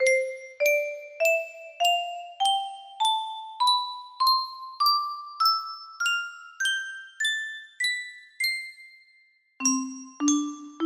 piano

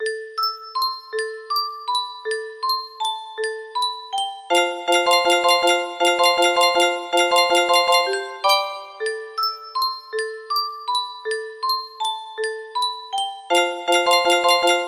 guitar

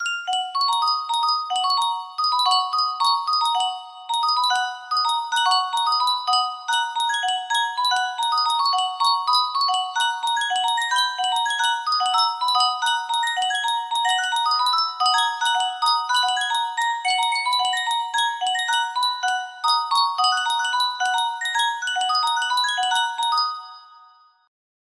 music box

